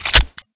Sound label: anaconda open, firearms weapons